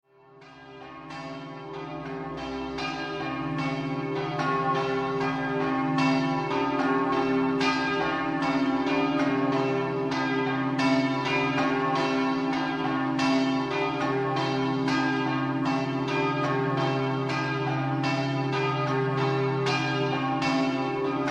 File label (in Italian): campane; cassacco